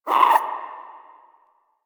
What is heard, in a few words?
Exterior Forest Critter; Warframe; Ambience; Duviri